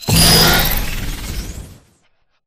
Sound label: drone power on; Titanfall 2